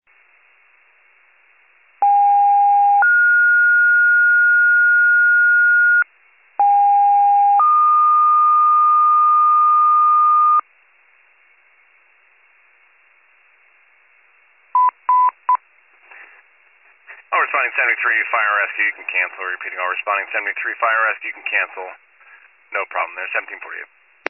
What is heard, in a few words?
FIRE pager alert